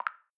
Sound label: Apply; Peloton